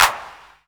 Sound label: clap, hip; Best Service; Dance Mega Drums